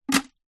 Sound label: animals fish tank aquarium no water display log drop in gravel; zapsplat